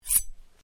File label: Blade Swish, Sword